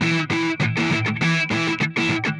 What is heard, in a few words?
Pushing Riff, Rock Guitars